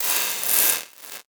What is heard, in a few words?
Transition Distortion Electronic; Adobe; Production Element